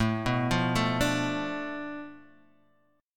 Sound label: B Minor 7th Flat 5th A